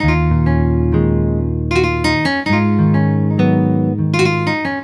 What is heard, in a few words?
Nylon Guitar; Sonic Foundry (Sony Creative Software); Mac Money; Guitars